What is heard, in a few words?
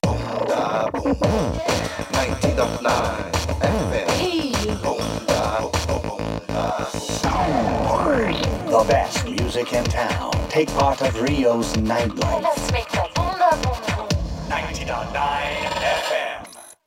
Radio Car Electro Jingle; gangstar rio city of saints